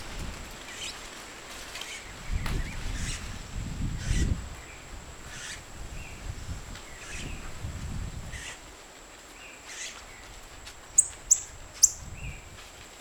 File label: paroaria dominicana